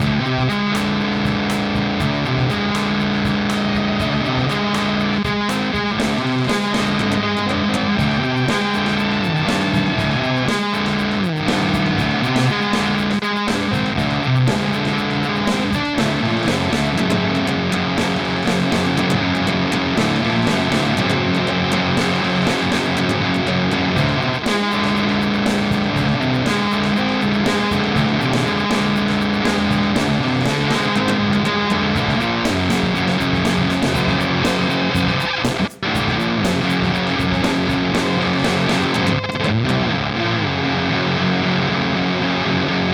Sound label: Beetronics Vezzpa Fuzzz